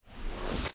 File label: saber off; Kylo ren